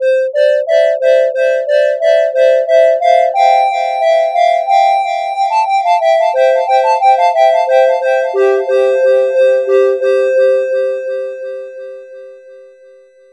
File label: two tiger echo, syn music; 迴音(0.35s); 合成音樂(Synthetic Music); Csound orc